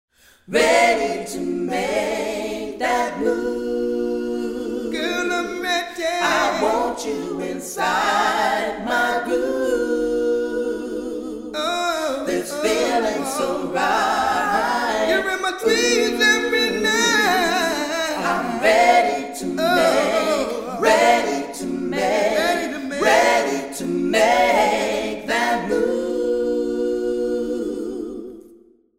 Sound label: Vocal Group LXP Plate